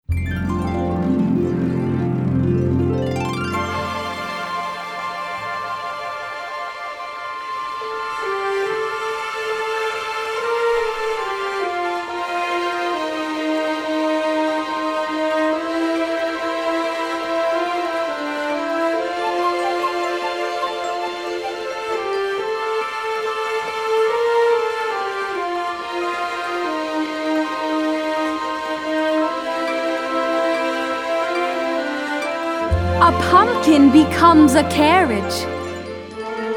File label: Guided Vocals; Cinderella